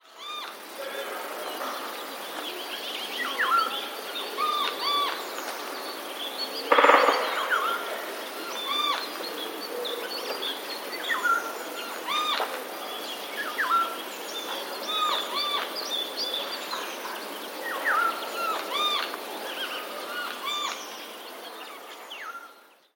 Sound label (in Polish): sojka; jedna piszczy, druga gwiżdże jak wilga